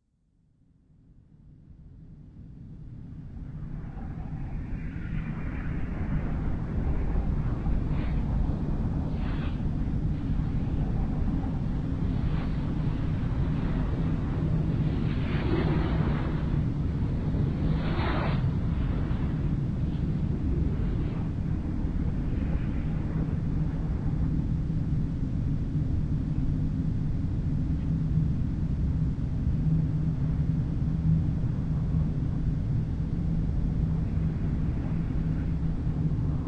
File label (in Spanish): sound ambiental; Anima ARPG